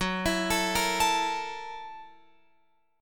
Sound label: Gb 7th Sharp 9th Gb Guitar Open F; Listen to Gb7#9 arpeggiated